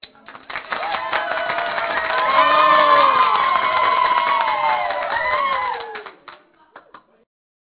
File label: ringer; Ringtones